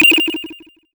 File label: Get Item 8 Bit